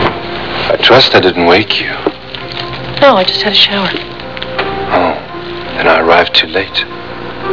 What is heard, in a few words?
shower